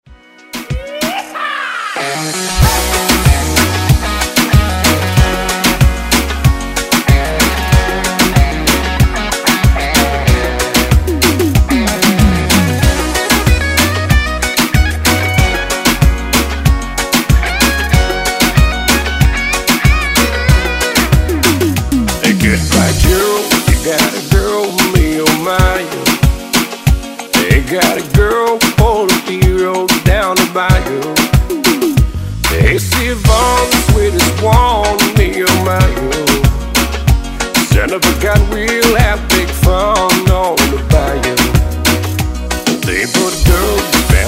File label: Moombahton Redrum